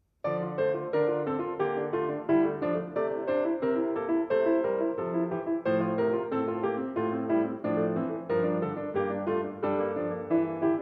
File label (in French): piano; la meute